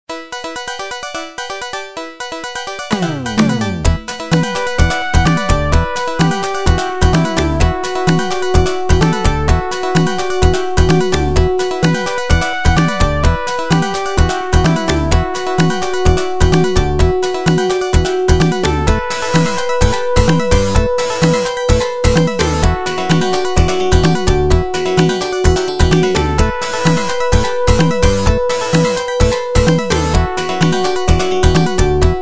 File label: Music BGM Arcade; Shinobi